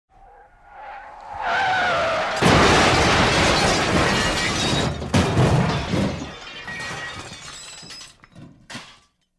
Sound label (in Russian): zvuk stolknovenija i padenija mashiny pri otskoke